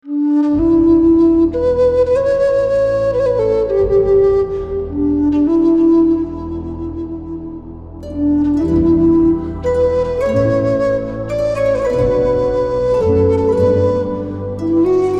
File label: Medieval Fantasy Intro Theme